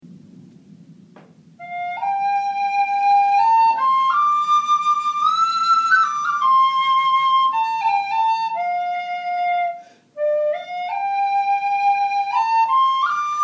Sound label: Slow Air, bagpipes